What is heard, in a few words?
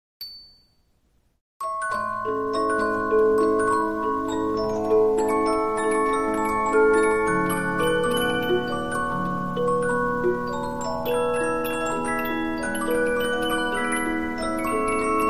Polyphon, 15inch disc musicbox